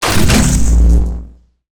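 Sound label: enemy attack power activate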